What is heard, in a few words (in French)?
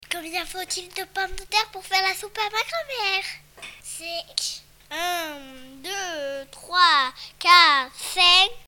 duo pomme